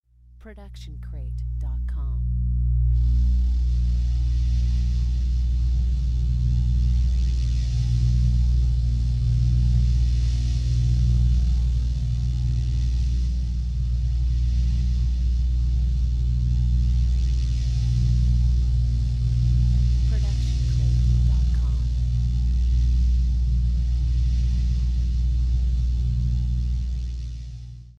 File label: SciFi Hum Loop; SoundsCrate